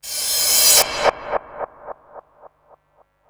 FX, retro house

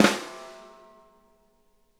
flam, snare; Sonic Reality; Interactive Drum Kits

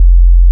BASS, 145 BPM; Ueberschall; Drum & Bass Resonance

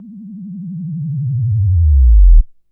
FX, Drum & Bass

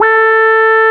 QUAC, QUACK SOLO, Synth

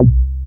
TB303; Best Service Gigapack 1; SYNTB303

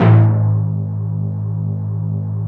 timpani, Yamaha TG-500

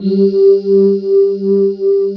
VOICES G2, JX-10; Greytsounds VOL-1

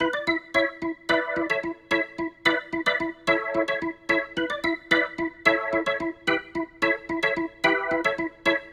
Rotor Riff, Organ; Loops; Rotor Riffs; Sonic Foundry (Sony Creative Software); Joe Vitale Organ Donor

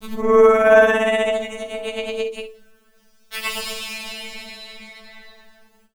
Female Stretch Vocals; Houseworx

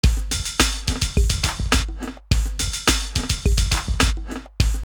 Hip Hip Hop 105, drumloops OldSchool; X-Static Goldmine

